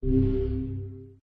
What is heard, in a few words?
check off; Replicant